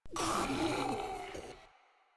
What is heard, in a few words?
Proto Infested Ancient Vocal Pain